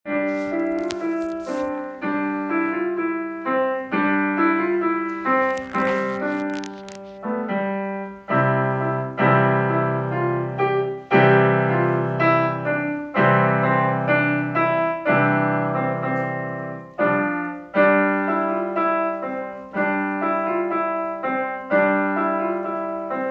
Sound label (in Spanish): Piano